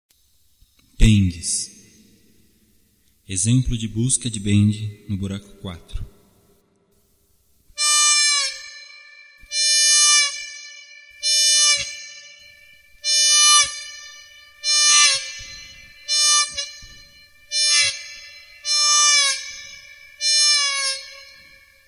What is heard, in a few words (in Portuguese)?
gaita bends; Gaita de Boca